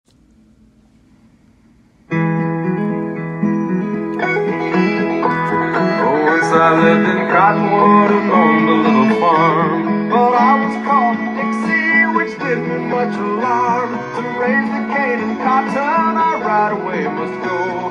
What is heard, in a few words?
Once I Lived in Cottonwood vocal